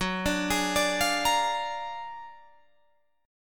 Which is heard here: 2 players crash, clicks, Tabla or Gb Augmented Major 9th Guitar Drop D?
Gb Augmented Major 9th Guitar Drop D